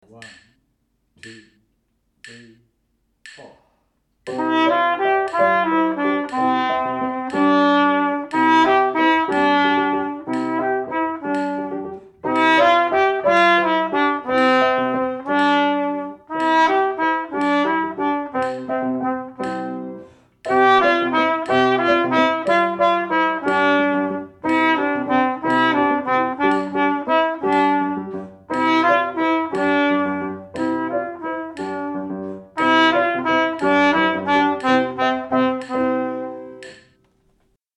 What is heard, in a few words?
Landler Trumpet piano